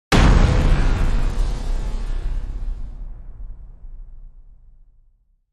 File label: Hit